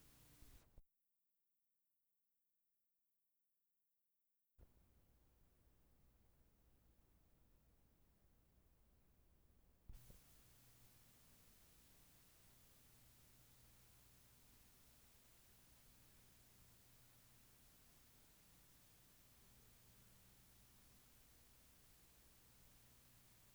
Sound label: melodica